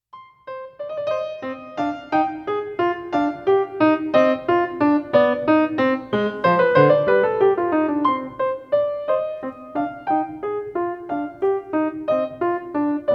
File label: Rondeau Bach pianist